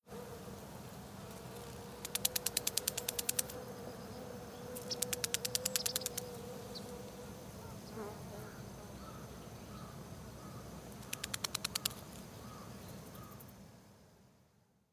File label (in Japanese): batta jump